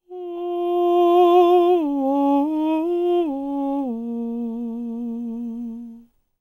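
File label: falsetto, Vocal Planet Jazz FX; Spectrasonics; SA FALSETO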